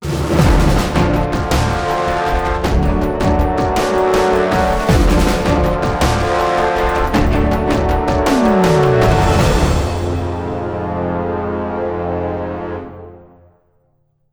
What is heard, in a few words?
Factory Objective Taken; Global Agenda